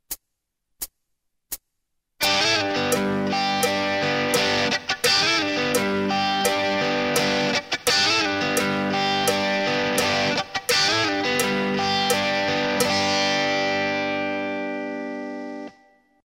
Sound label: fill in